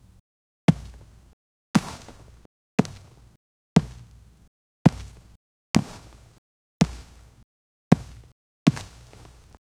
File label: picking up a shoe from, footwear